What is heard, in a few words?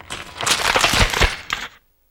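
bone crack; E-MU Producer Series Vol. 3 – Hollywood Sound Effects; Science Fiction; Pumpkin Head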